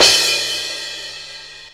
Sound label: Crash, Cymbal; One Shots; Drums; Terrified; Ableton Live 12 Lite; Core Library